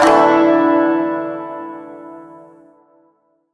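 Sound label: Shawzin Pentatonic Major Chord E; Chords; Warframe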